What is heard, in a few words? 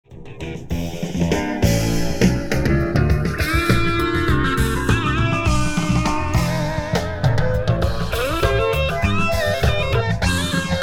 VCA Based 8 Pole Phaser